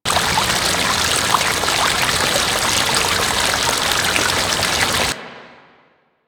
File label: Water Fountain Small; Ambience; Interior; Warframe; Orokin